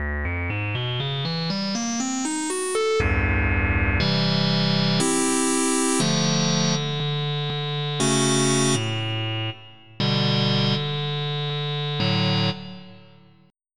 Funky GM 2 Kit; Onj's Soundfont

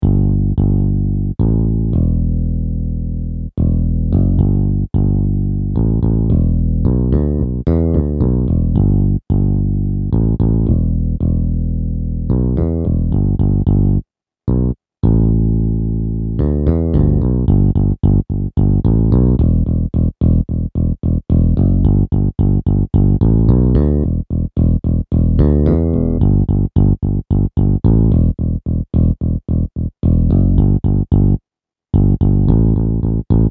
Bass; Mobiclic51

